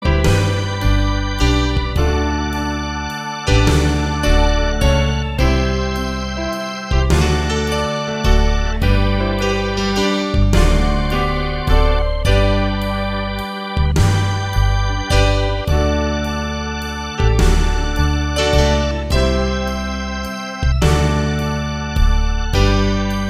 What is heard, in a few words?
blues